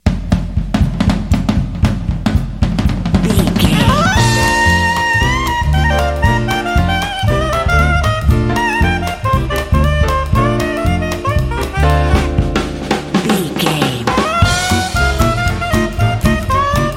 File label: swingin clarinet